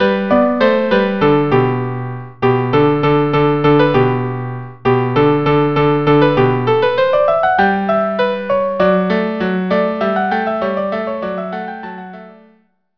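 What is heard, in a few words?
allegro violin cello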